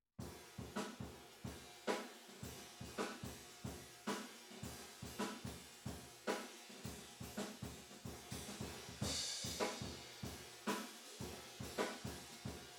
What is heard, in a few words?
TB GTR